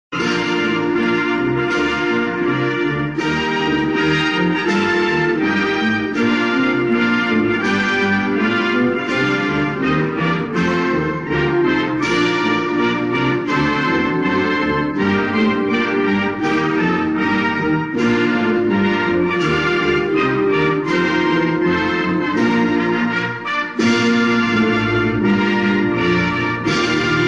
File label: national anthem; Rhodea